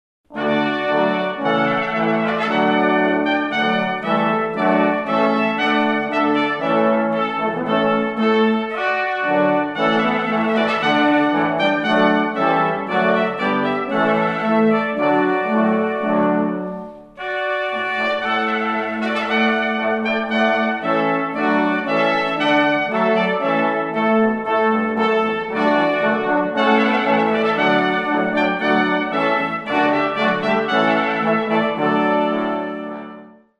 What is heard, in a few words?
trumpet voluntary